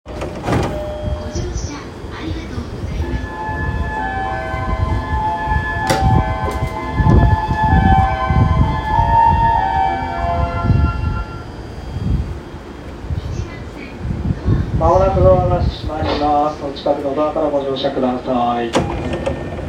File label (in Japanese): JR 高崎線 尾久 南, train sounds